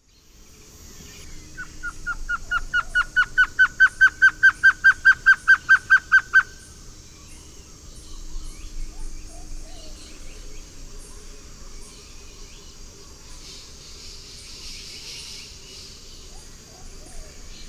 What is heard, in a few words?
Trogon curucui, song; S. America; Eastern Lowlands